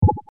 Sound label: Interface Sound, Sci-Fi Space; Interface Sound 2